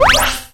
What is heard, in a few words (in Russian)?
knopka cifrovoi visokii rezkii blizkii